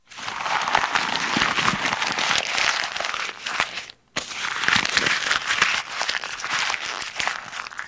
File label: Paper crumbling; office